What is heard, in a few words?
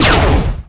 fire Heavy Repeater; svencoop; portalhouse